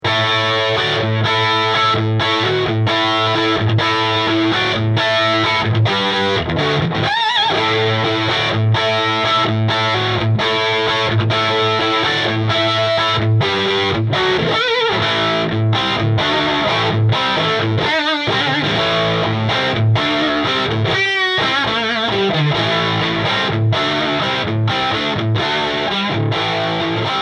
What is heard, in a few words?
AC30 Crunch